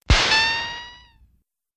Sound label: Ricochet Elect, bullet bys & riccos